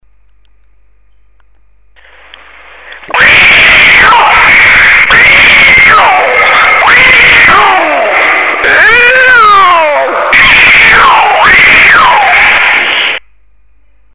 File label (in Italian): gatte pazze